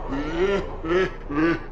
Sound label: Evil laugh without slime; ludum dare 46; Boss; SlimeBoss